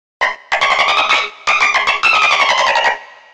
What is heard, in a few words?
dolphin